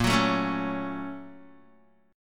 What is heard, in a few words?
A sharp Minor Major 7th Sharp 5th A sharp Guitar; A#mM7#5 strummed; 6,9,7,6,9,x